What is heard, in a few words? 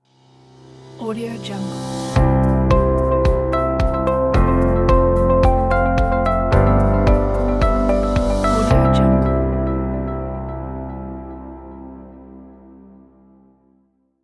upbeat corporate logo